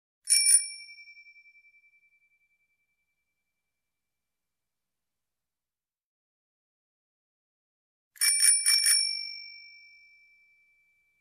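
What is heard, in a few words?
bicycle bell. sound of bike bell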